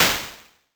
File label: death; Incantation